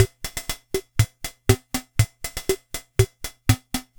Yamaha PSR-22 Rhumba

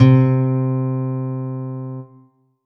Roland GR-1 Acoustic Guitar C4

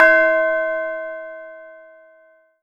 Steel Bell C6

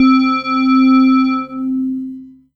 Korg DW 8000 Hollow Bell C4

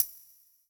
Zill

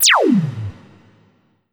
Ensoniq ZR-76 Laser Zap

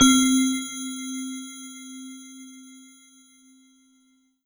Roland JV-2080 Pretty Bells C4

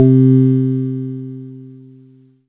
Yamaha PS-300 Organ C3

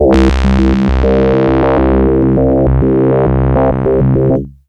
Yamaha DX7 Brass S H C2

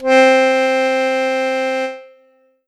Ensoniq ZR 76 Bandoneon C4

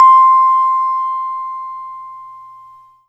Yamaha PSS-280 Music Box C6

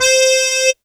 Casio CTK-611 Bandoneon C5; Synthesizer - Wind Instruments

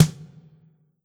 Yamaha FS1r Drum Kit Snare

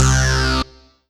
Yamaha MU90R Rezzy Bass C2

